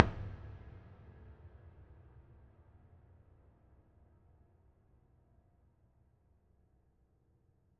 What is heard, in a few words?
piano body